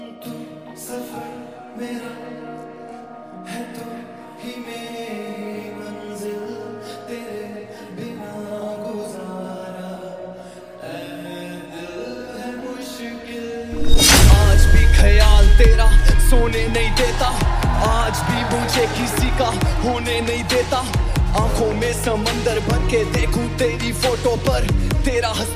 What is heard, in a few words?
Heart Touching Breakup Song Ringtone